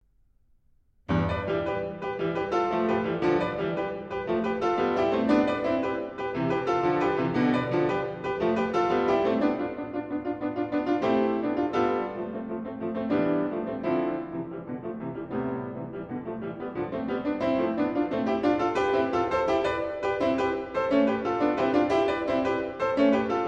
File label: Alternating Thirds, Piano; Book 5; Mikrokosmos, Sz. 107; Bartók; Complete Solo Piano Works